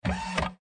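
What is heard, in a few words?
robot sounds; zvuki robota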